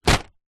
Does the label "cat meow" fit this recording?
No